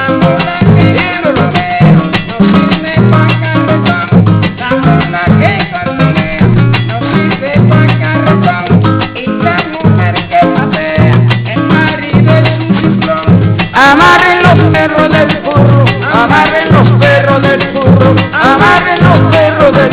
guaracha

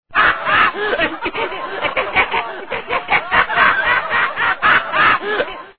risa

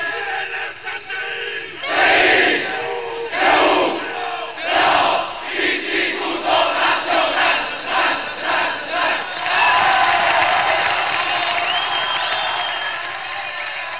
Grito; heroe vader

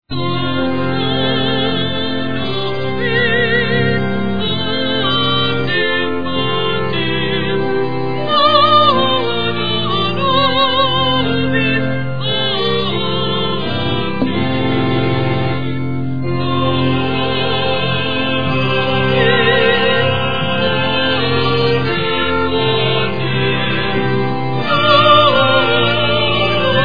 cuarteto; coros solistas; dona nobis

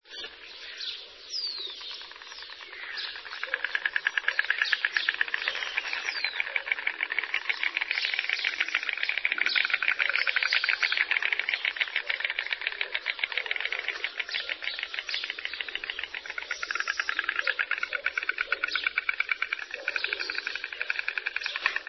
sonido pueblo

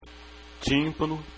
timpano

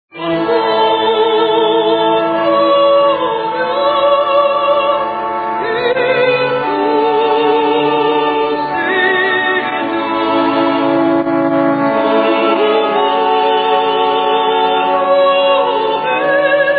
oh santo dios, coros solistas

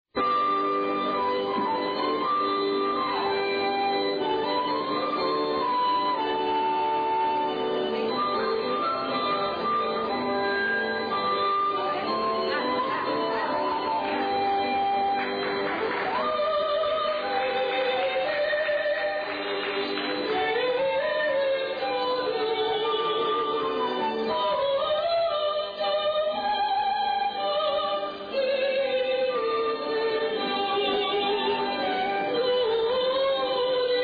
soprano; haendel

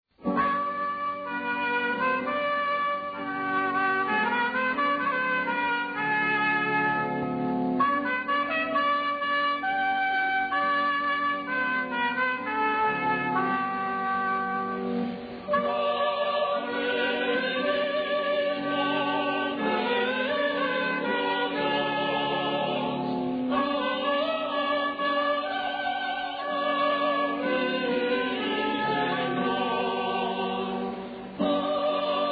cuarteto; coros solistas; haendel

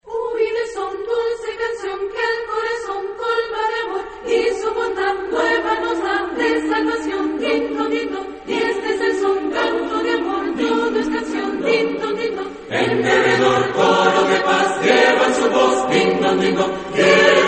villancico de las campanas

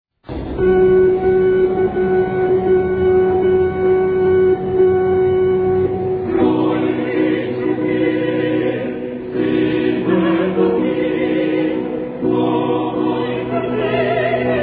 marcha cuarteto; coros solistas; wagner

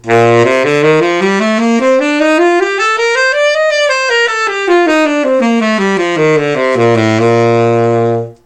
Larsen 105 2MBC Legere TT Escala

